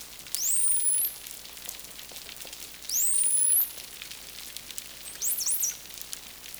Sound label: T truncatus whistles short